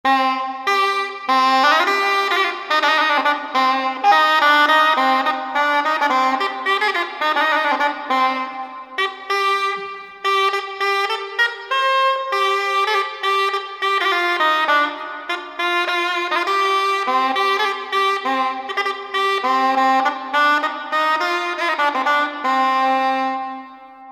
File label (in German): schalmei